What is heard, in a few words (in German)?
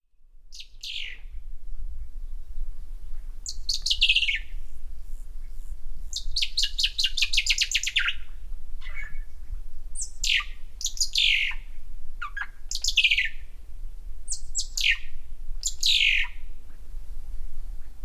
Vogel; CKGR; Passarge